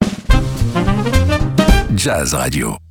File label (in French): habillage jazz radio